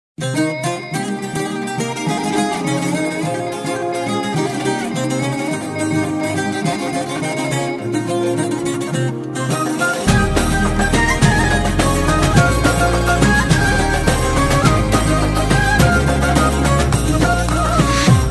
Turkish Music

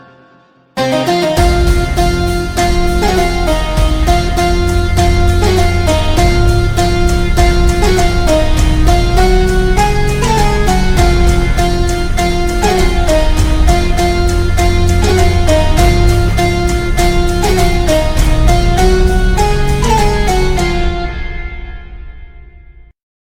BGM; KGF Perfect

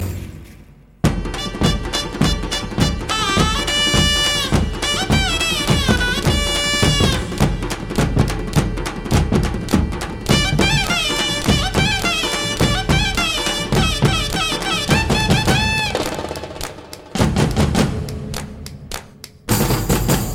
Instrument

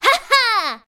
Attack Ha HA; Larxene

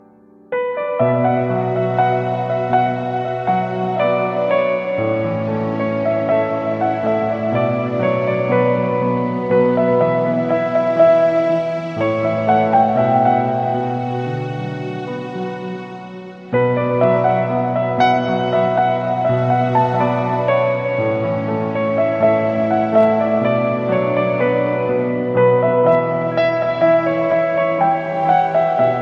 Music

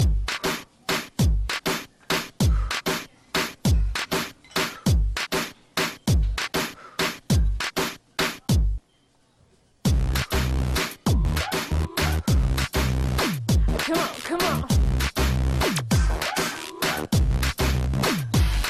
Rocking Beat

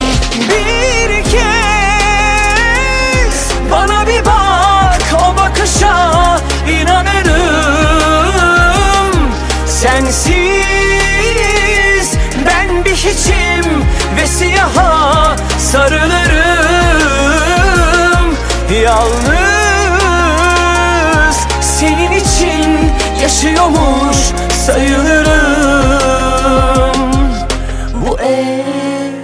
turkish romantic

